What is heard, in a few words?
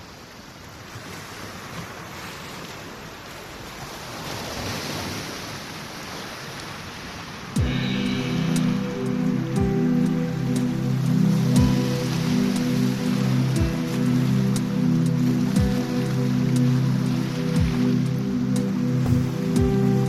Sea Waves in Summer; Relaxation vol.1 - Nature